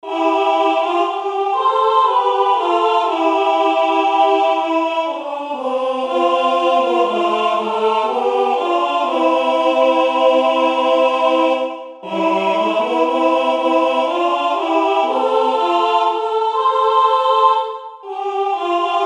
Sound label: church choir; whispering hope; Original Compositions for Church Choirs